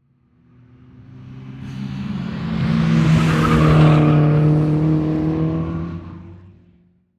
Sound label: Transportation Car Race Car Drive By Curve